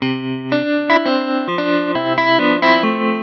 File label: guitar